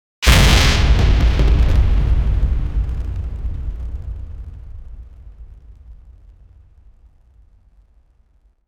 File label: metal hitting metal sword